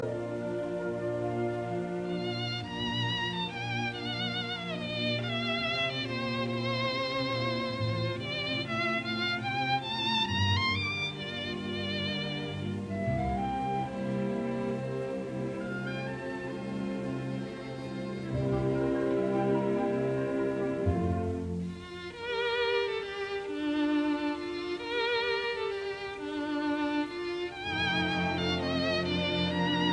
Violin Concerto in B minor, Andantino quasi allegretto; Saint-Seans; Op 61